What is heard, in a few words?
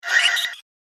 Laser Bright Fire